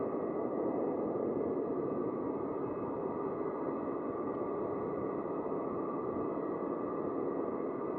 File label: magic radiant lp; ambient soundscapes; dota